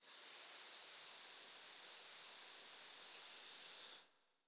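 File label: choir SSS, Voiceless; Sound & Vision; Gigapack I CD 2; CHO Vibr.+NoVoic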